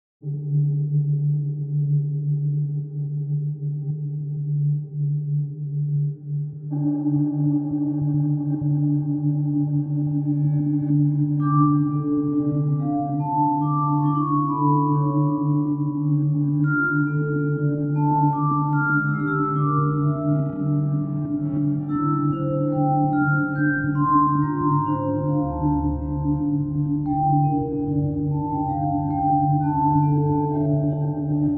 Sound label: Ambient